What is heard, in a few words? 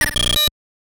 hit solid wall; ducktales